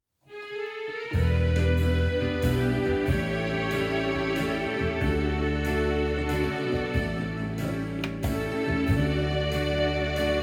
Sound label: mod or jazz waltz